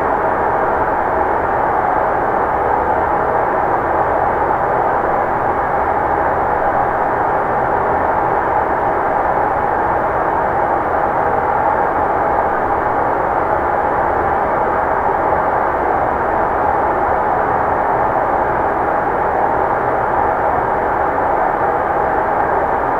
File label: Signal Noise